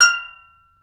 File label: Steel Drums F5; NorthStar - Global Instruments VOL-1